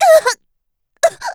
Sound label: die; Eternal Return; Tia; Voice Line; KOR